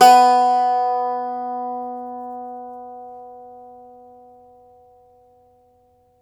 IRISH, Old World Instruments

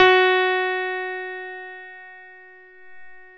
CP-70 F#3; E-MU Formula 4000 Series Vol. 3; Analog Odyssey; VK Instruments

